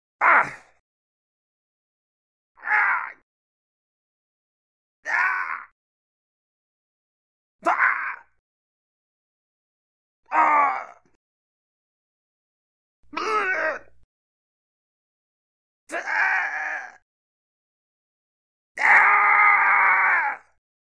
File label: Male Pain Hurt; Male Pain Hurt Sound Effects - 8 Sounds